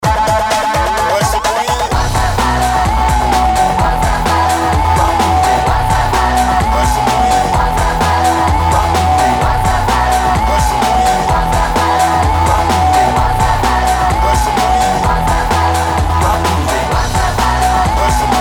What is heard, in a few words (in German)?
rock musik